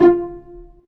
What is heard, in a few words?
STR PIZZ F3, STRING SEC